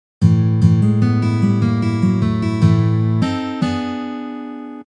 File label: Blues Guitar Turnaround